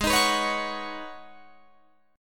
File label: G sharp Minor 11th G sharp Guitar Drop D, chord